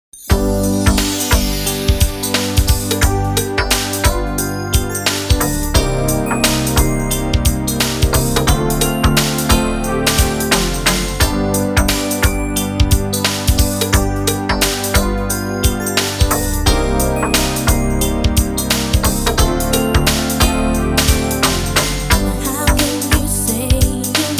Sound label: sax solo